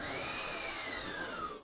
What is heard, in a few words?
air zero; OpenBVE